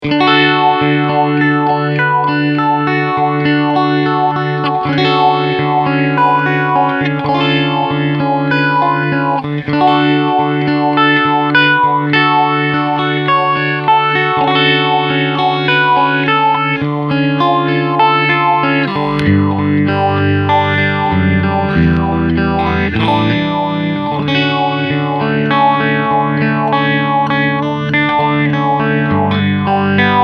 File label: phaser